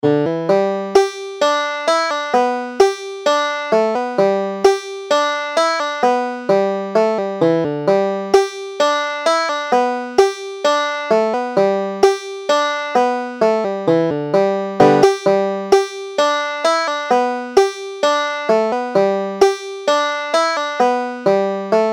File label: wild horse, banjo